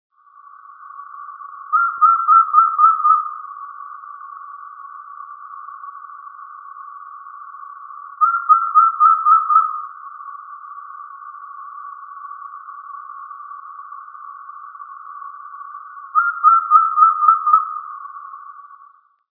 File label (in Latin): glaucidium mooreorum